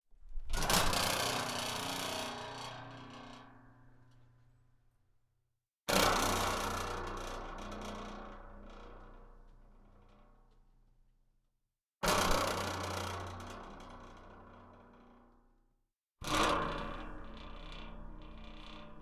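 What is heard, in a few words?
door metallic sound with rattling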